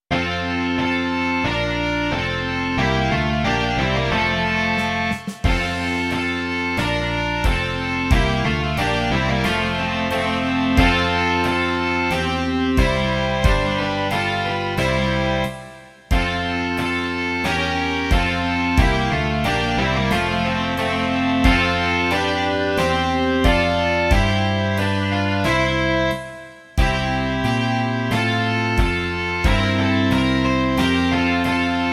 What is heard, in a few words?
rock